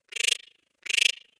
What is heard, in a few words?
music duck, notifications, Samsung; Samsung GT-i7680; cmcc